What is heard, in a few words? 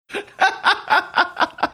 laughing